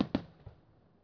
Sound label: PERC COMBI; Crypton; DrumBase5000